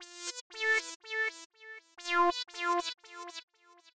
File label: Synth loop (120BPM); musicradar